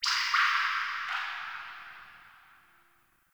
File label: Sentient Lair Drip Interior Small; Warframe